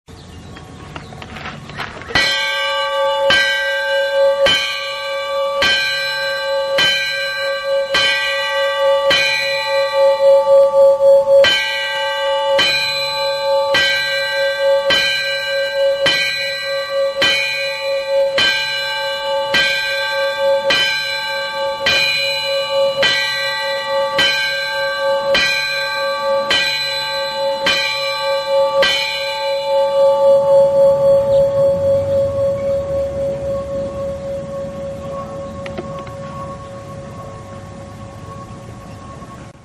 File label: Bell; California; Perris; OERM; Garden